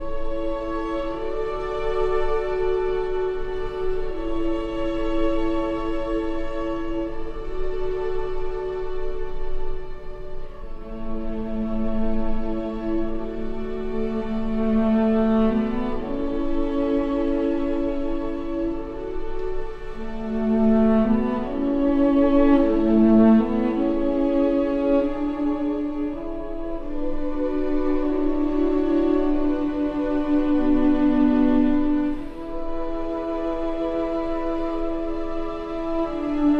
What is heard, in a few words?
for cello and strings; Archi di Santa Cecilia